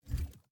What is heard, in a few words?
Hand Paddle Release Extra Light